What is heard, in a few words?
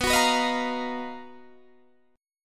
B Major Sharp 11th, Guitar, DADGAD